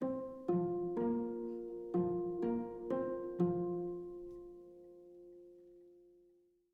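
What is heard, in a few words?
Harp And Violoncello; SkyDive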